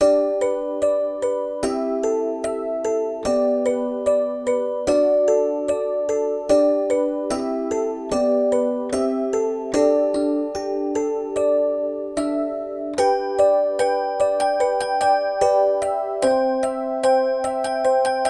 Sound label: Music Box; Abyssrium; Android